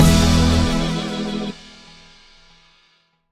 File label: Win Stinger; assault on metaltron ps4 switch windows xbox one gamerip 2019